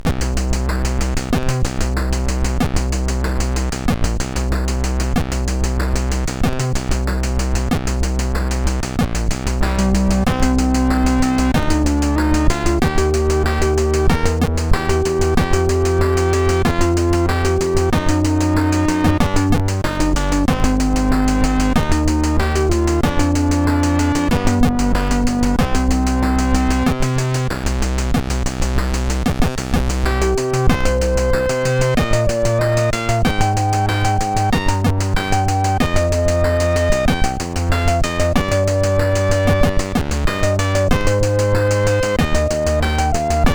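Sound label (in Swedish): MOS 8580 SID chip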